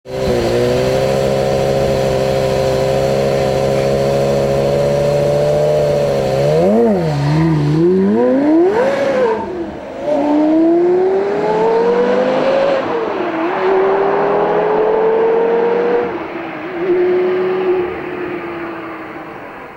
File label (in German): carrera gt beschleinigen, Démarrage Carrera GT